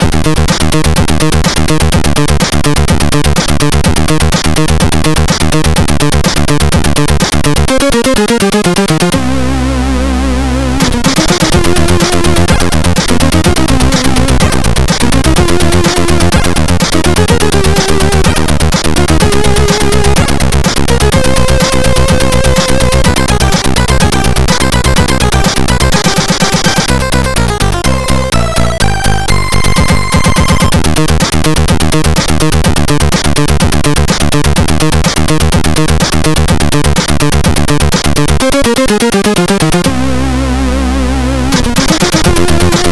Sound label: c64 in game; r type